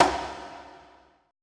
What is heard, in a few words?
BONGO R; BPC DRUMS